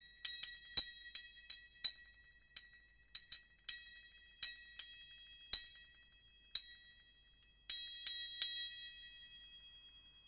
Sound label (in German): Fingerzimbel auf Haltestab